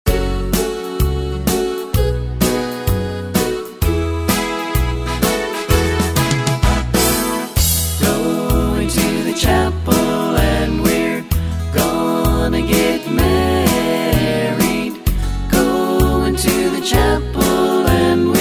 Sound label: harmony